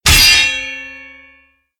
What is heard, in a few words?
impact heavy; tridroidznnpc